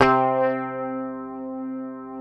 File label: PICKED, Bass, C3; Sampleheads; Will Lee Bass Library